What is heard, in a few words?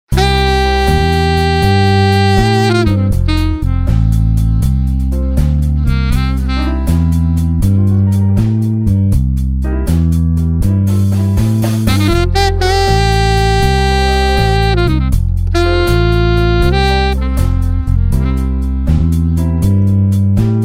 pentatonic; blues